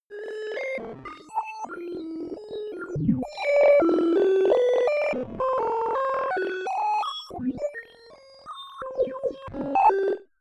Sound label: random calculation